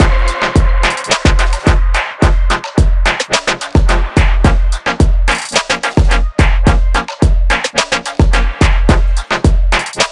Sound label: Win; forza street